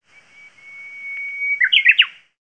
uguisu, birds

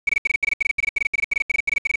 kantan, insects

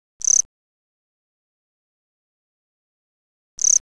kumakoorogi, insects

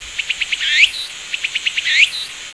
tsukutsukuboushi; insects

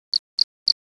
haraokamekoorogi, insects

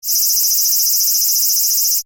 aburazemi; insects

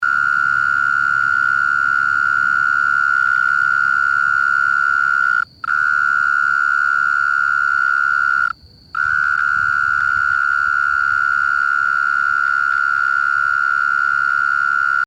kera, insects

